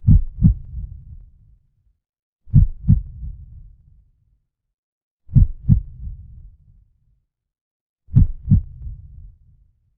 horror heartbeat

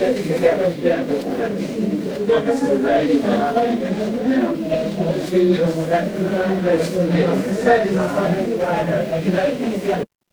crowd murmur

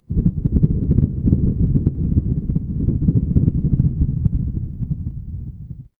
ambience thunder

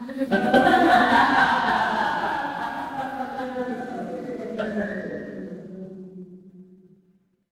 crowd laughter

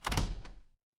door open

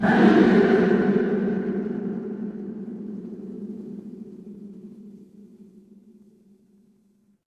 crowd cheer

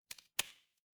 glass crack

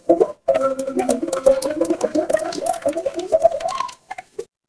water pour

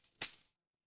feedback celebration

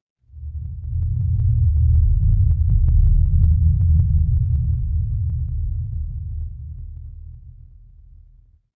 horror drone

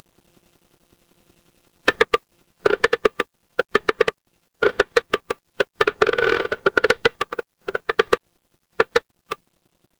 horror creak

